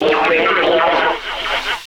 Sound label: SFX, 180 BPM, Drum & Bass